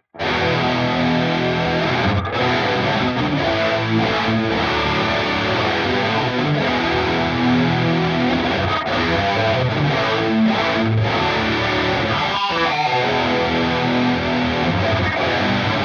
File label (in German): DI Aufnahme Micro Pitch Plug in; NDSP Petrucci